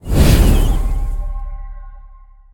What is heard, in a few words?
start; smite; god sol